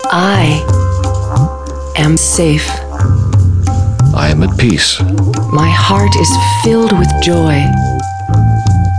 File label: RHYTH, LOOPS